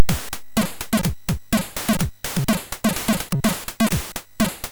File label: Drums